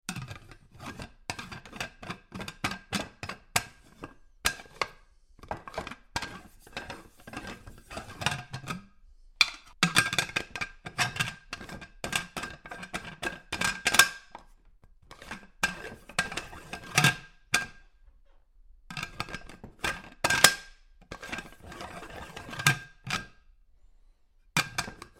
Screw Top Sweetie Jar, Old fashioned glass jar with screw lid; various moves; Lids; Objects; Tom Hutchings